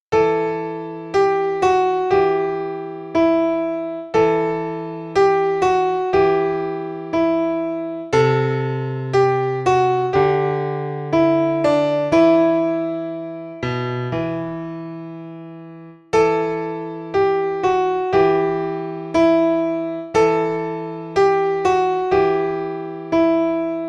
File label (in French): Piano seul